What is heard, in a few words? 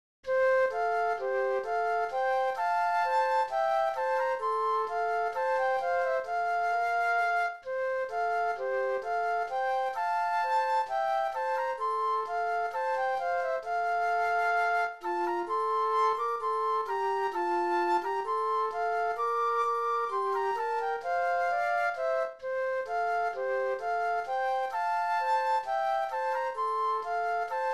flute duet; patriotic